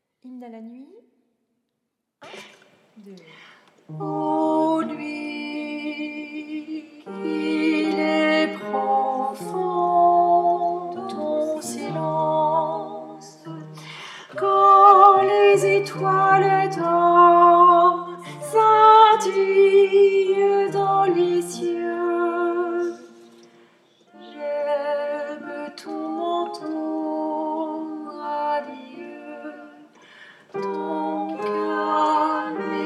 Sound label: alto; hymne a la nuit